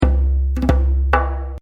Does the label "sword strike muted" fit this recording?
No